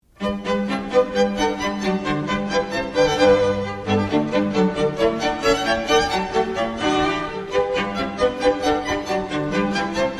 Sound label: bourree from water music suite in F; Handel